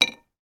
biscuit cookie drop onto plate

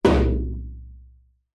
musical drum tom plastic hard hit single strike

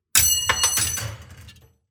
Impacts SIMPLE; soundbits

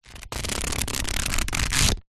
household book pages flick fast; zapsplat

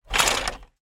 sport clay pigeon thrower release clay

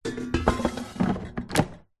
air fryer pan insert

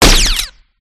abstract guns; PMSFX

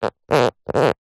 industrial duct tape rub finger squeak

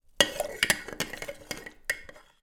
teabags and water in teapot stir with metal spoon; food drink

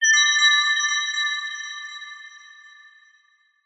twinkle mysterious metallic chime; sound design; zapsplat

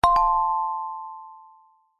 household doorbell chime classic

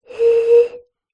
bottle beer blow air top liquid inside

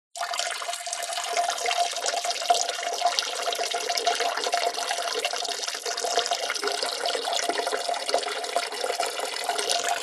male urinate toilet; human

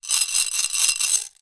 marbles lots in glass container shake

